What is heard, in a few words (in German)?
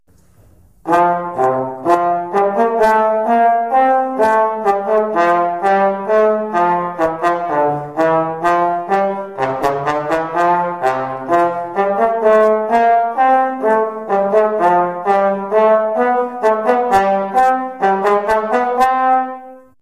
posaune